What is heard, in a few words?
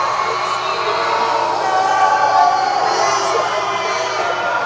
torture